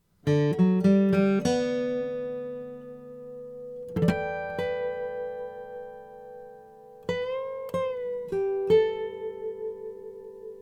mcpherson carbon; carbon black

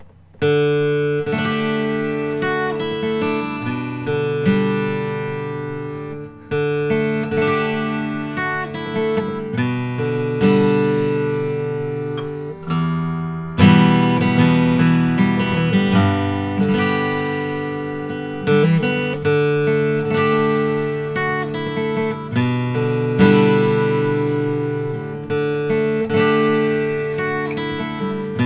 mcpherson all koa; natural

